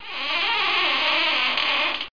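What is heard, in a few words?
Door Creak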